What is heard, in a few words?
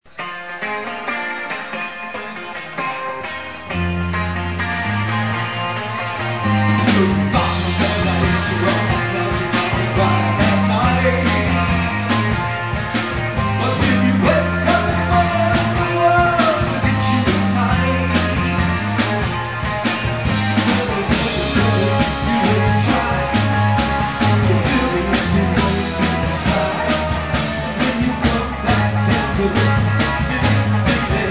Kicks